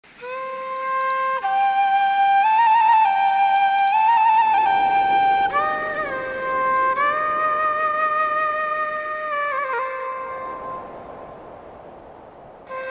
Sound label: saaz bell